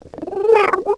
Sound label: play, Catz